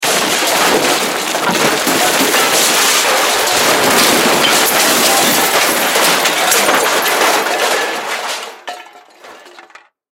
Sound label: Crash Junk Debris Long; Sound Effects:Materials; Blastwave